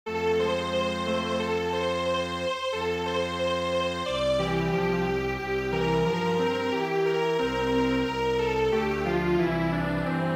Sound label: sparrow house Strings Quartet